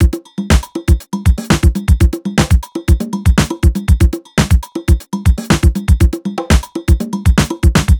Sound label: 120 hard full, Nu Jazz House Beats; PowerFX